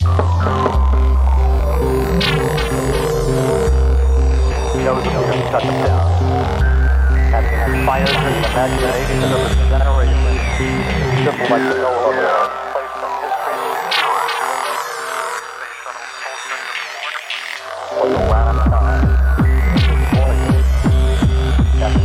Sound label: The Rave Scene